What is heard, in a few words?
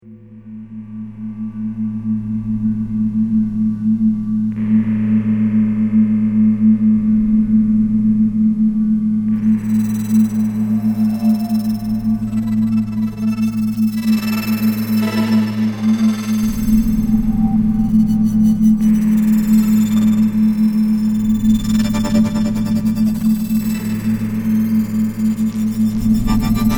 Glitching, Moody